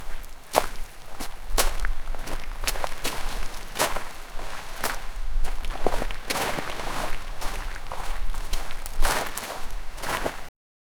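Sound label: footsteps sand desert dune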